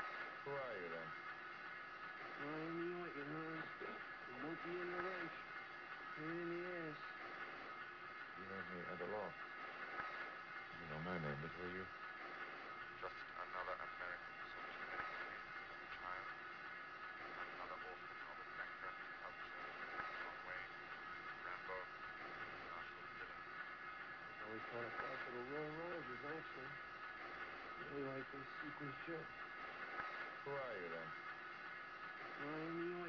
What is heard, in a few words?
suppressed spectral Subtraction echo Planar